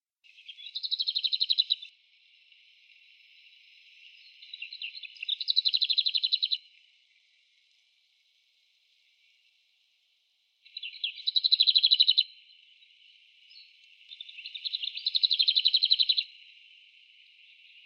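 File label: lesser whitethroat voice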